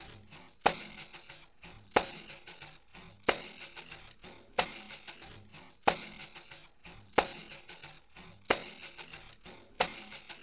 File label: 092 HipHop Tammby, Percussion; Digidesign; Pro Tools; Pro Tools Essential Loops; Tamb